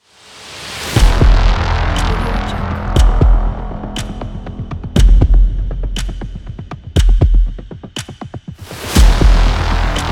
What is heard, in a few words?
countdown tension cinematic trailer ident